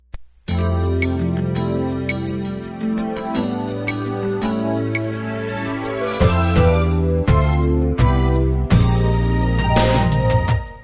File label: Instrumental